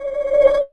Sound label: TRILL, anime sound fx, comic